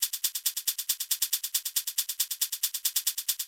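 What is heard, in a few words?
16TH hi-hat, Techno 138 BPM; RED ALERT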